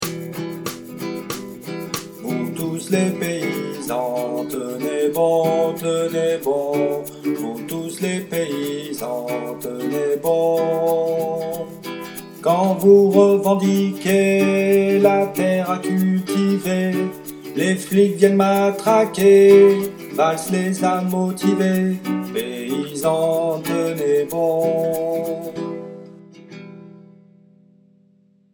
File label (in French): Basse